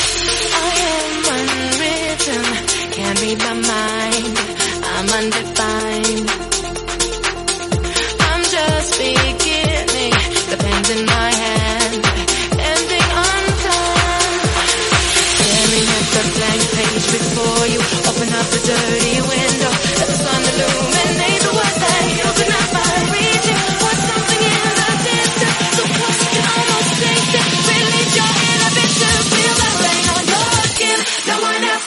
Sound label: 125 bpm F